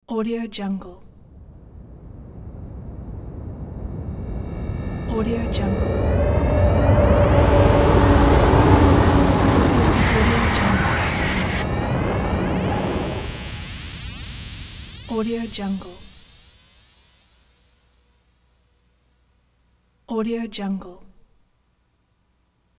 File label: cloaking enemy synth sweep